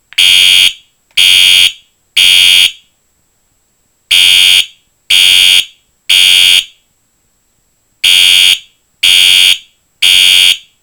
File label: U-MMT Temporal Horn